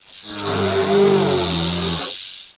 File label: geneworm idle; svencoop